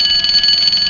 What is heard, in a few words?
Burglar Alarm; Playable Tutorial Level; TR2